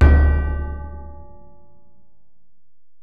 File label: Bell, Alesis DM-PRO